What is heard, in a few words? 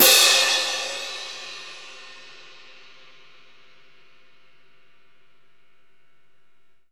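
ORCH, CONCERT CYMBAL; Northstar - New Gold VOL-2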